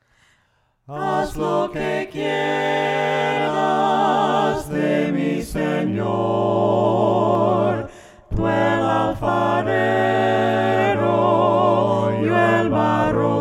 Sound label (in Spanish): VOCES